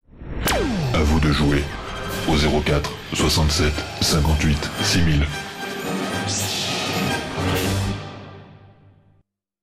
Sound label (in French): Jingle; Jeu Star Wars France Bleu Hérault